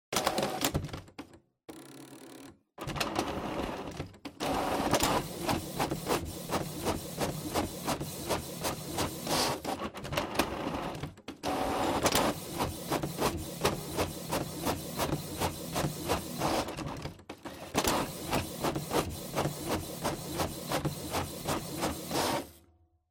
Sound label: Printer Print Multiple